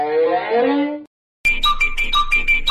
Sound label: scout moves finger